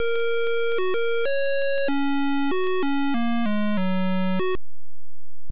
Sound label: Title Beeper; Pentagram